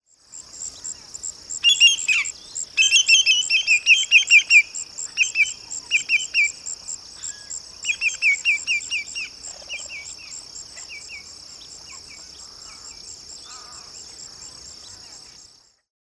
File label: greater yellowlegs; paul marvin